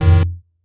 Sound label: 8bit